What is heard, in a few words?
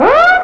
alarm; Ueberschall; Techno Trance Essentials